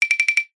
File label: cartoon knocks taps wood bright; zapsplat